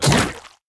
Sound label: enemy acid evolved attack